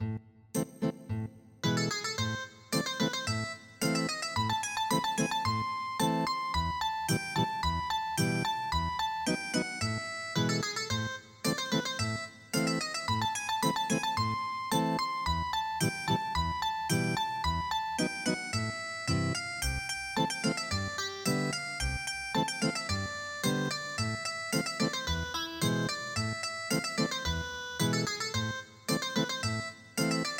Rondo Ethnic Guitar; midi; traditional

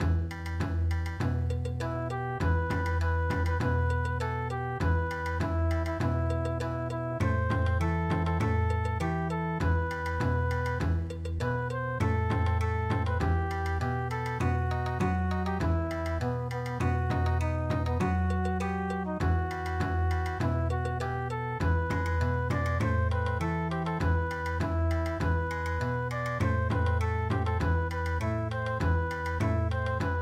Bon Odori

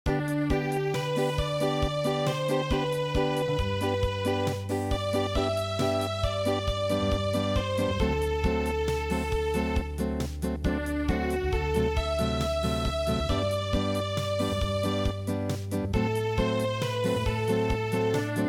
Unplugged, midi

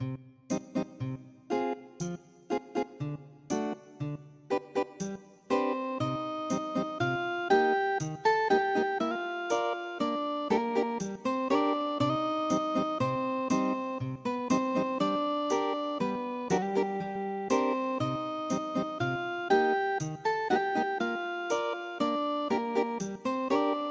greensleeves ethnic guitar

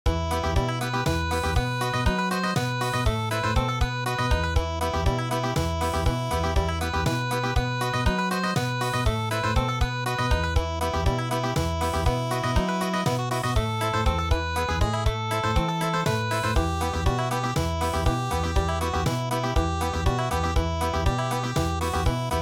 Garba Country

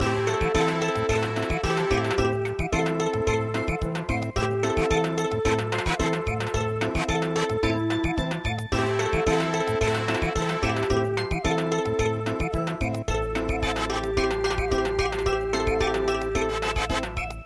Samba

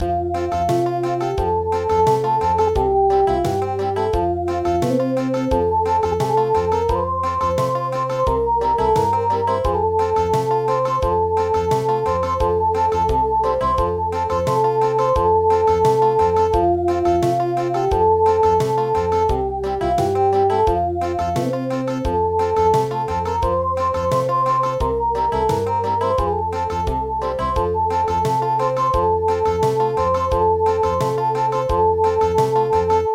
Country

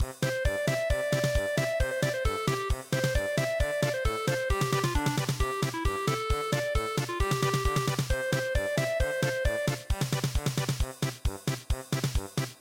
Polka

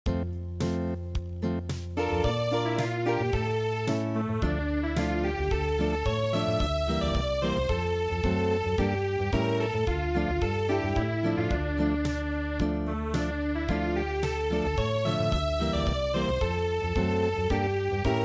Unplugged, midi

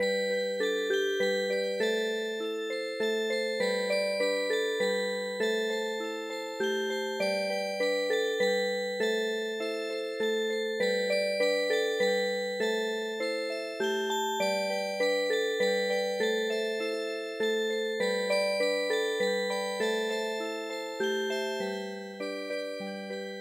Music Box, traditional; 韓国民謡の「ナムグルセワング」に癒し系のアレンジを施したオルゴール曲を聞くことができます。; 「ナムグルセワング」は、アップテンポな3拍子の韓国・朝鮮の伝統曲です。

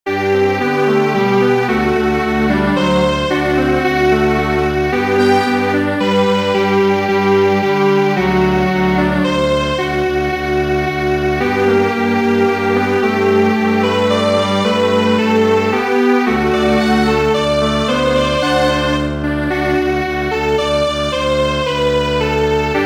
Strings Quartet, traditional

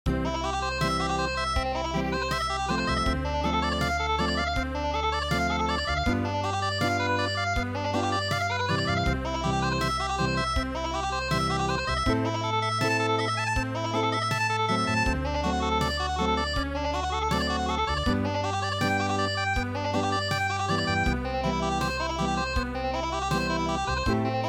Unplugged

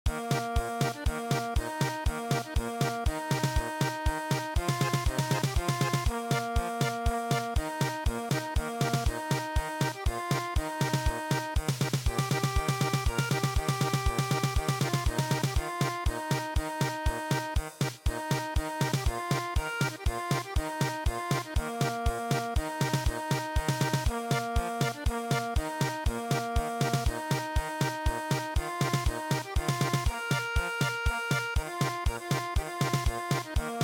German Polka; gang gang su wol rae

